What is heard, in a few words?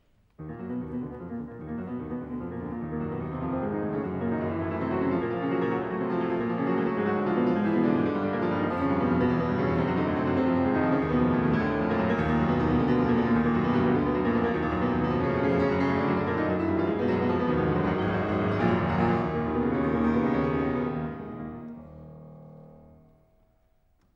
preludes op 28 no 14 in e flat minor; מוזיקה קלאסית